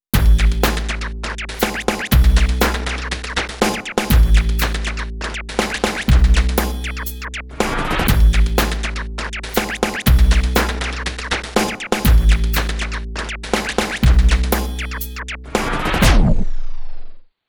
high scratch

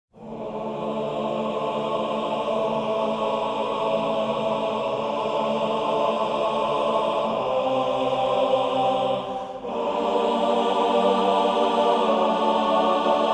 Choir Only

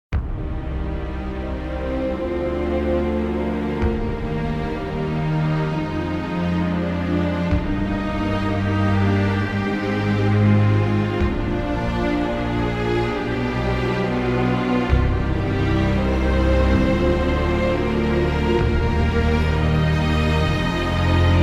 Orchestral

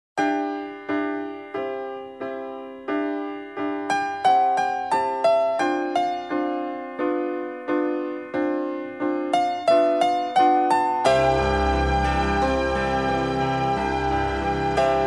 Easy Listening & Classical; Sound Ideas

